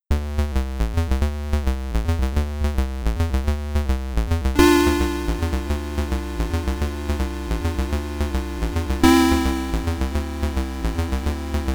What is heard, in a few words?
Main BGM (SID Stereo); rygar commodore 64